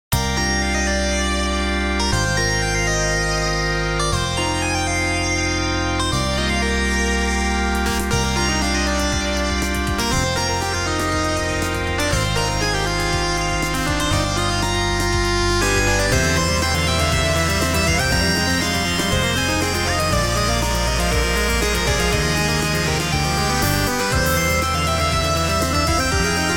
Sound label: Dungeon Baroque; frogatto friends